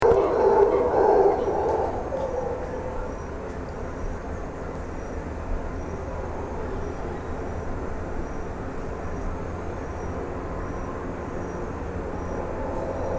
Alouatta singe hurleur; Tortuguero; Communication animale

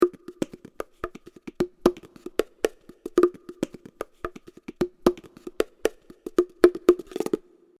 son Tambour ntenga; Percu; Duperrex

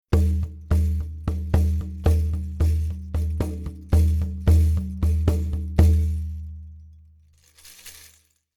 Doyre son; Percu

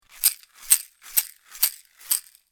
son Maracas Caxixi; Percu; Duperrex

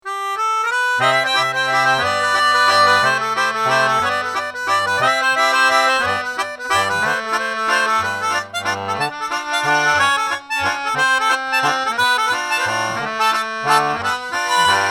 Valse des vieux; Porta Picta inauguration du portail peint

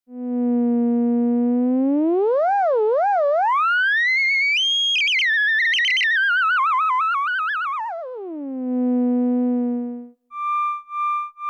Theremin son; Electronique

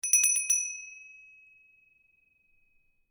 son Cloche aplatie; Percu

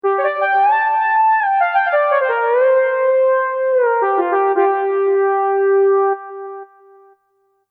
Synthe PSR son; Electronique

